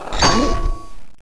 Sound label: armor